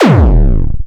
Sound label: VFX2 Sub